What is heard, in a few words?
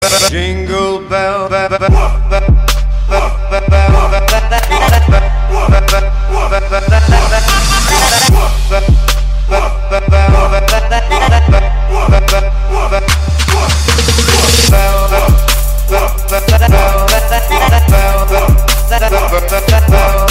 jingle bells trap remix